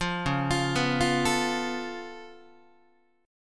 C sharp Augmented 7th C sharp down Guitar, chord; arpeggiated; JGuitar

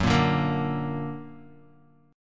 Gb Augmented Guitar; Gb+ strummed

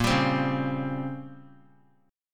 A sharp Minor 6th Add 9th A sharp down, chord; strummed

